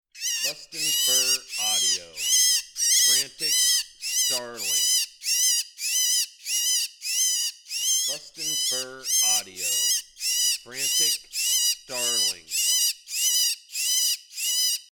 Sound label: Frantic Starling